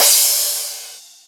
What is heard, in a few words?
Crash